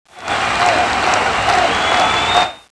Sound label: SWITCH